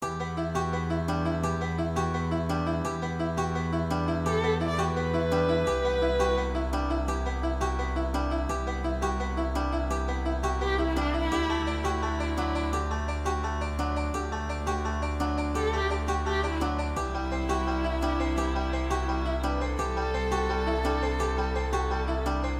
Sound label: bluegrass intro